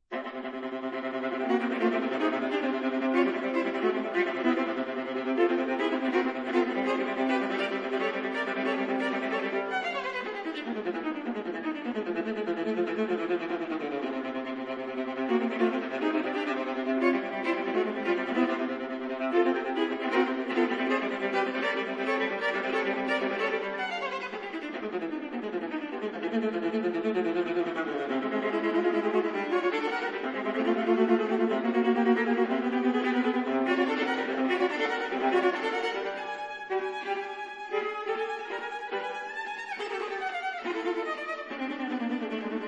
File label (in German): Sonaten für Viola